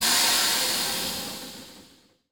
AIRBRAKE; SIMUZENSOUND

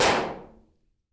Medium Metal Room, Reverb; iZotope Hybrid Reverb

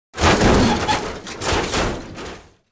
COLLISION METAL; SIMUZENSOUND; COLLISION MATERIAL

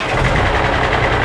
MOTOR ON; SIMUZENSOUND

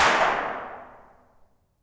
Metallic Hall, Reverb; iZotope Hybrid Reverb

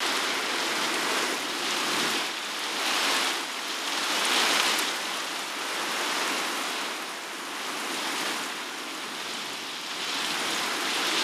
SKID FROZEN; SIMUZENSOUND

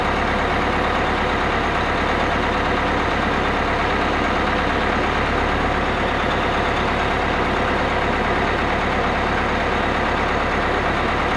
BUS IDLE; SIMUZENSOUND

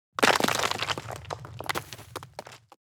COLLISION CONCRETE; SIMUZENSOUND

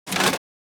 COLLISION CRASH MID; YBR125

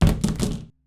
Plastic, Collision Plastic; SIMUZENSOUND; TURISMO

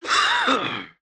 COUGH MEN; SIMUZENSOUND

